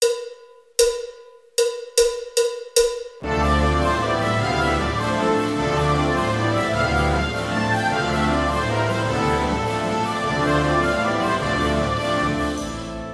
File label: Spanish Dance